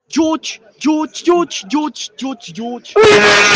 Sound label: aaah